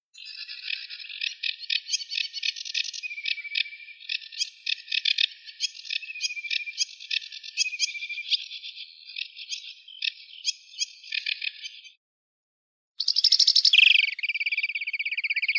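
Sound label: sedge warbler, Acrocephalus schoenobaenus; kamishovka barsuchok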